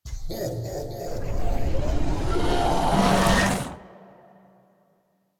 Transformacao Cresce; Super Mombo Quest

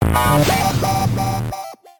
Efeito Ruim; Square x Square

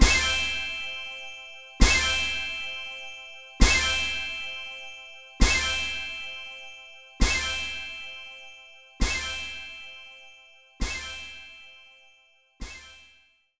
Fumaca Fadinha

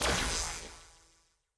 Bola Pequena; super mombo quest

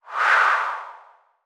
Vento - Abertura

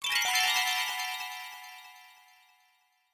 Morte Cristais; Super Mombo Quest

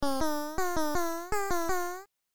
Orelhão; zueirama

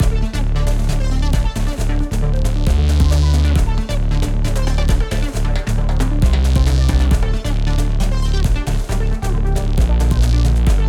Trilha Dos Monstros Intro

